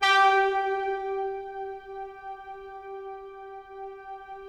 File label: brass G4 L, Yamaha VP-1; OKT.BRASS; Music Store